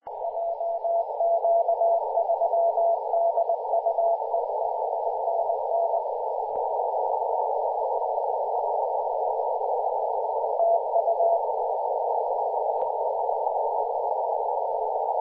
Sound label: 50.099 MHz CW